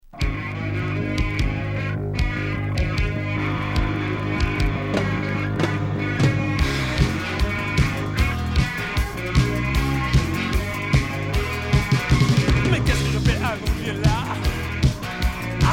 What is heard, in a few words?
Punk rock; CAEN